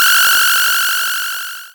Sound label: laser beam; arms